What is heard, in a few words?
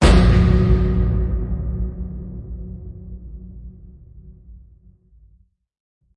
Landing Hit Metal